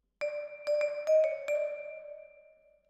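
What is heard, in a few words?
Vibraphone Close, Jazz for piano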